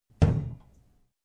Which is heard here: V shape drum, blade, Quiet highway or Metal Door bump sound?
Metal Door bump sound